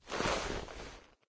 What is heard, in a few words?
hunter roll alyx; video games; ambient; source engine; portal2; outland